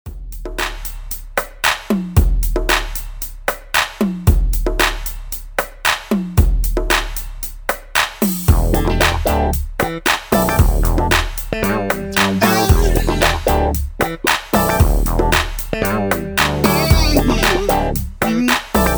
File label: Funky Thing